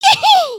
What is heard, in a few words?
excited, emotes